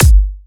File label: kick, drum hits; Anthemic